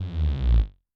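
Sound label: jungle bass; Yamaha DTXpress-II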